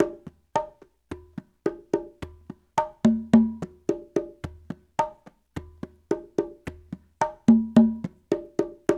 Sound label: Latin Congas, African Contemporary